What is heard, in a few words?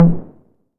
808 HT1 Tape Sat, Tom; Tape808; Goldbaby Tape808 & Tape909